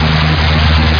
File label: drone ray loop; hero alien